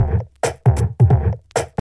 distorted techno break - pluck; drum loops

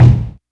kick sound a sharp key; kick drum samples

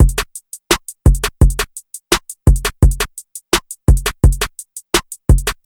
85 bpm trap drum loop f sharp

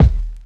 bassy kick single shot c key

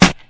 studio snare one shot f key

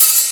treble heavy open hat b key

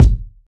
bass drum one shot g key

